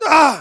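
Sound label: pain sharp, German; Demoman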